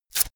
velcro large open fast

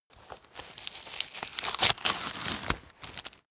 duct tape pull down

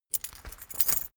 key ring mid size pocket put

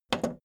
video tape player plastic rewind click fast

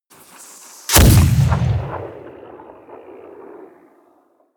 cannon steel close stone

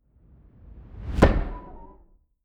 metal weird flute

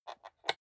rotary control single turn creaky

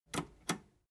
metal button robust click slow

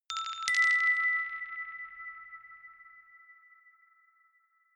notification glockenspiel rattle fifth up

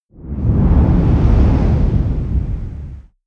whoosh dark storm heavy

